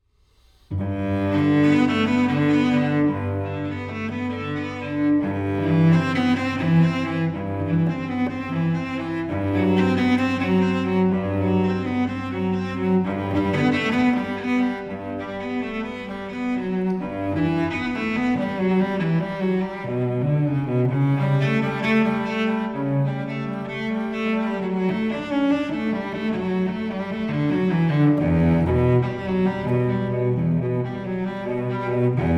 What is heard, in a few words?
Cello Suite